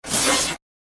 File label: Space Hydraulic, Scifi, Space, Hydraulic, Fast, Science, Fiction; BlastWave